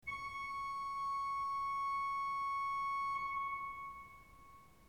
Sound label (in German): ton, orgel